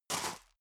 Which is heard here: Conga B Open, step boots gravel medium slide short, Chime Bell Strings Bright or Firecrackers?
step boots gravel medium slide short